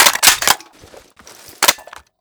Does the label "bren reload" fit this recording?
Yes